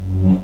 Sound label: saber